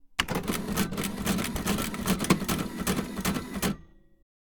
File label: Cash Register SANYO ECR 335 Print, Electric Cash Register; The Soundcatcher